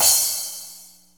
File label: Crash, Cymbals; Drums & Percussion; One Shots Drums